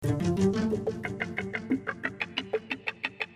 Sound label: Gtr & Pizz Echoes 90 Gm7